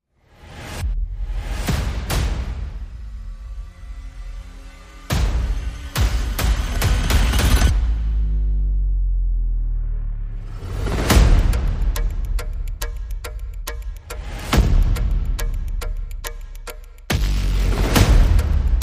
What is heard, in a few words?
Percussion and Effects